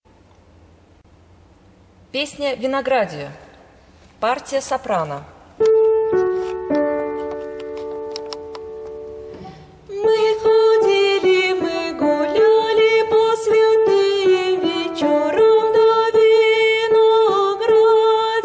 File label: soprano; Vinogradie